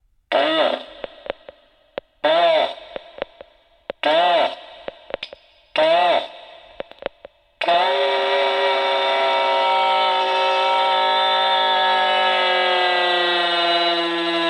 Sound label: Happy Vox